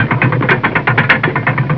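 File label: drawbridge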